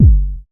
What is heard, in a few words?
KICK